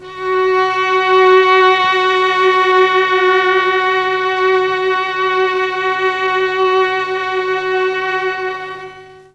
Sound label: F#4 300 VIOL, Mellotron strings; Mike Pinder - Mellotron Archive CD